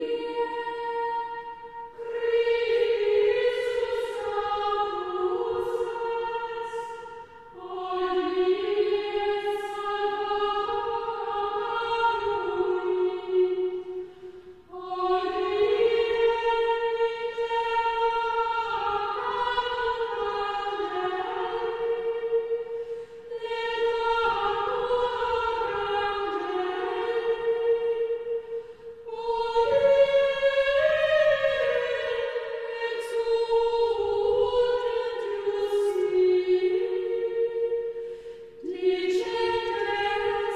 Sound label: Chant Antiphon; Traditional; Chester Processional